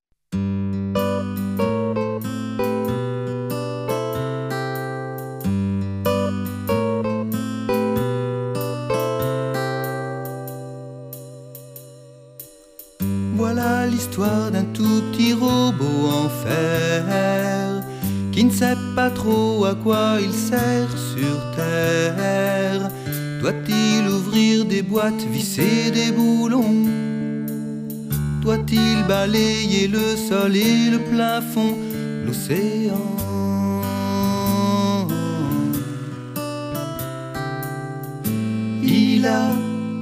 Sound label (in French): ensemble vocal variété jazz; chant du monde